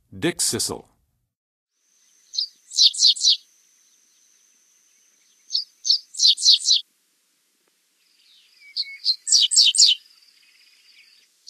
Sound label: Dickcissel, Songs of Birds of North America; Western USA; Western USA Songbirds